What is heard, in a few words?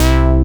Basses Root C